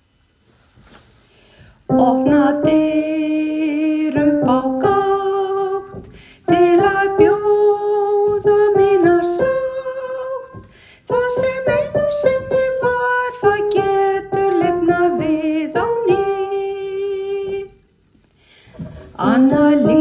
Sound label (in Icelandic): sopran; Samferða